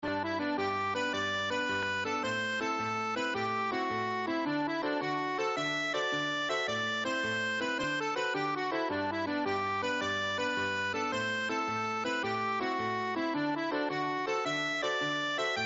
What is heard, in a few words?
jig